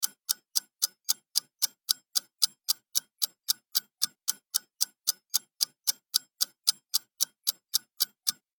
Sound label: clock